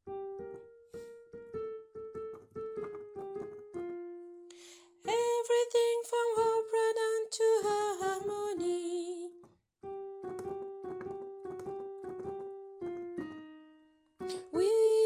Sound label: tenor